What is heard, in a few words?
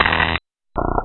spark; lmctf